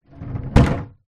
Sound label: household wheelie bin small down on concrete ground